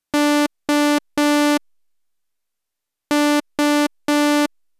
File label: OB-X8 VCO 1 vs VCO 2